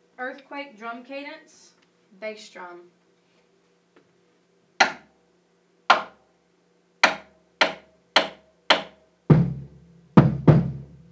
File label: drum cadence bass drum; earthquake